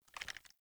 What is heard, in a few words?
Pistol Reload Rotate; Weapons; DE; Warframe; Tenno; Lasrian